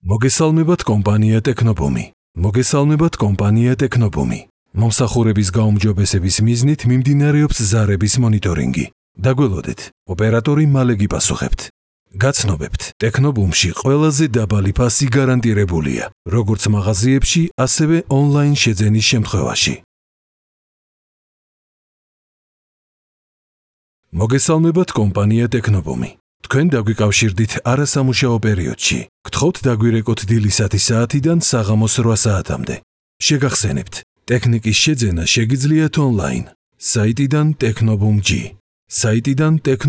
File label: techno boom call center